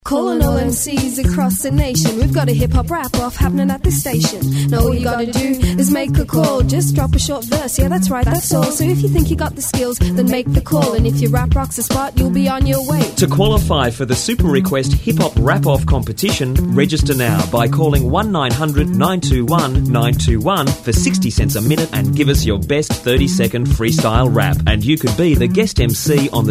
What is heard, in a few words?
Hip Hop Rap; Competition